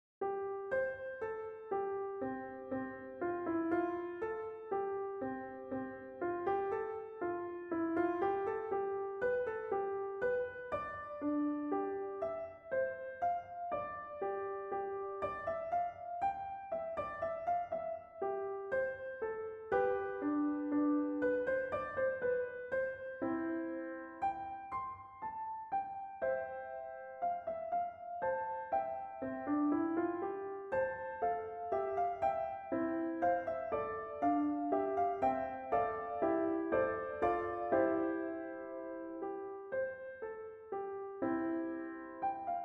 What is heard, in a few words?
Kalimba